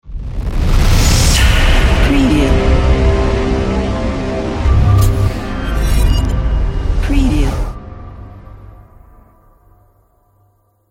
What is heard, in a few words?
aggressive epic logo